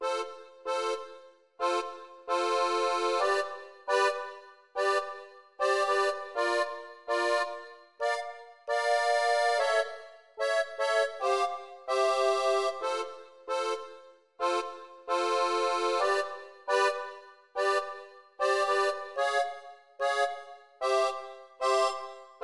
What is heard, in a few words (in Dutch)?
Accordeon; Toki Tori 2